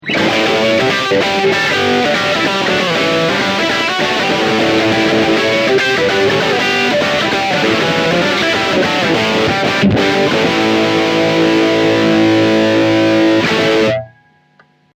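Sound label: Bridge Channel 1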